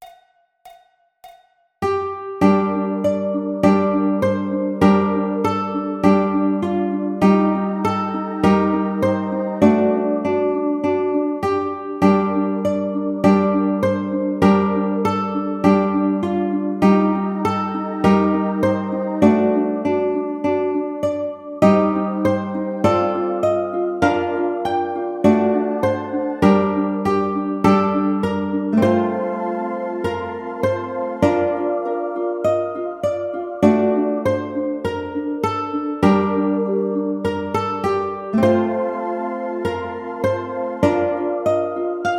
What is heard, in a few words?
Ukulele; God Rest Ye Merry